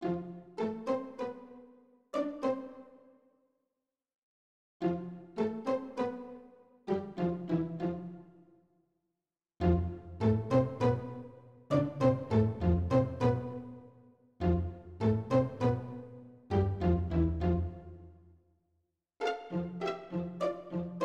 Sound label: Suspense Theme; Ducks